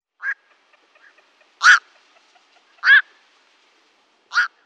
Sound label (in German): Nachtreiher Nycticorax nycticorax